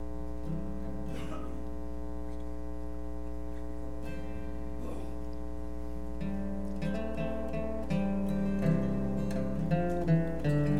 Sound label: singing